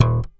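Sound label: Yamaha thump D#1, Bass; Masterbits CLIMAX 7; PATITUCCI BS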